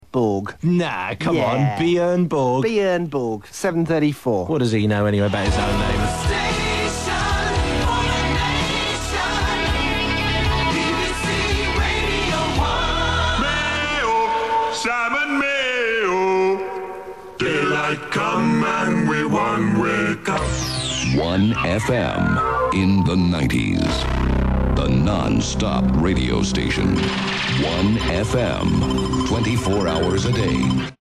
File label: jingle; s mayo